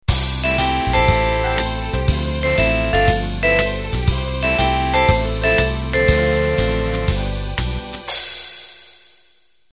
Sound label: cool jazz intro